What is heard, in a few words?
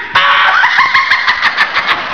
"hahaha"